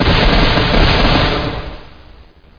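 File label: weapon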